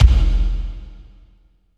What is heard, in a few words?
KICK, Tekno Industrial; East West